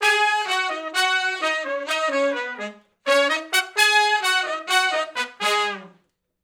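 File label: R&B Riff (Ab) unison, Horncraft; Sony Creative Software; Crimson Blue and Fabulous Horncraft 4 RnB; Horncraft for R&B; Sections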